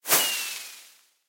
launch, fireworks; minecraft

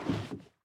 paddle land, boat; minecraft; entity

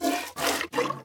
milk, cow; minecraft; entity

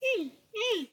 worried, panda; mob; minecraft

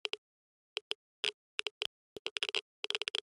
click, nether, basalt deltas; minecraft

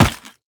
impact, goat; mob; minecraft

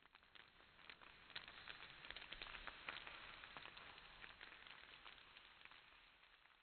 long debris, ambient, nether, basalt deltas; minecraft

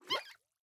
idle air, axolotl; mob; minecraft

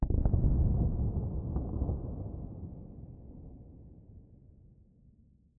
basalt ground, nether, basalt deltas; minecraft

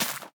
sapling place, bamboo; block; minecraft

